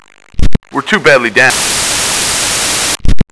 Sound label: die